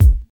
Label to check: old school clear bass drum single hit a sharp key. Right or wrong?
Right